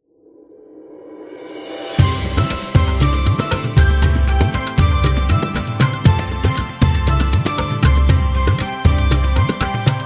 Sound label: Indian Wedding Music